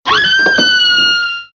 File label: girl scream